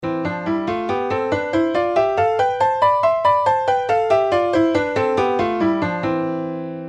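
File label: in a sixth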